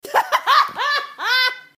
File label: laugh